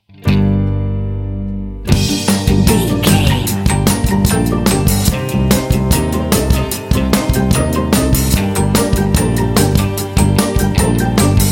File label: modern european folklore dance